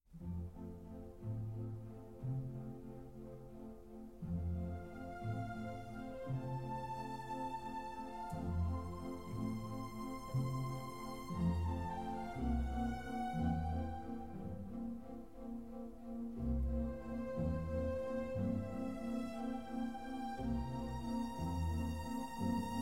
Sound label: Piano Concerto major; Mozart; K467